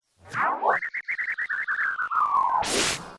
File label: synth space weird synthy sci fi power up blast